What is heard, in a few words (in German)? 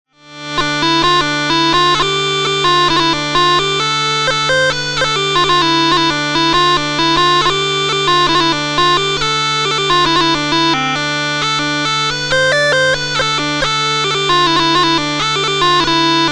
huemmelchen d moll